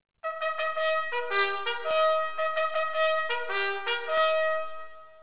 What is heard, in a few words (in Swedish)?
stracktrav, signaler; dragoner